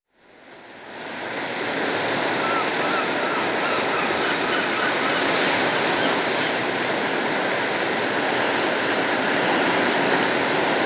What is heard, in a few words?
Waves On a New England Beach; Dr. Sound Effects; 210 Nature Sounds - 20 Hours of Relaxing Natural Ambiences for Meditation and Sleep